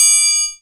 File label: TRIANGLE, perc; Zero-G; Funky Elements